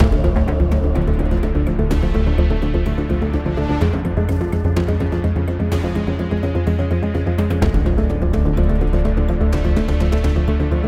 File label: Character Select Loop